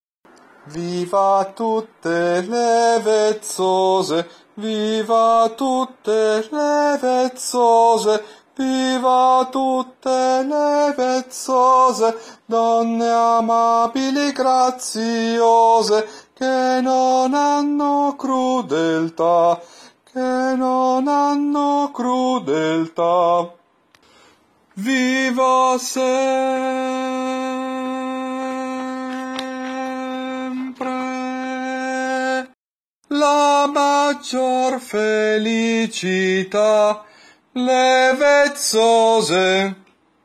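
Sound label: vezzose soprano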